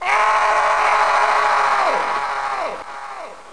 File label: ahhh